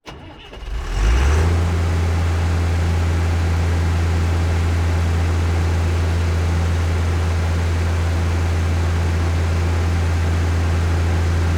Farm Claas Dominator Combine ONBOARD Turn On Start Idle Long Stop Shutoff; Combine Harvester 88 SL Maxi; Dramatic Cat